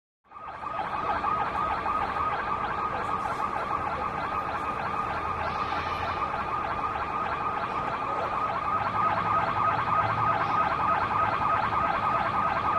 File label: Alarm Distant Perspe